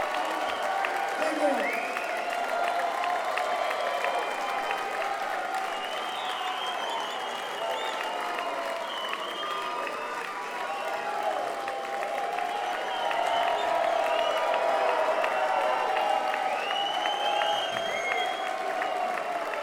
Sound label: Encore Break